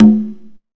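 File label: 127 drum 2 L, Fresh Disco House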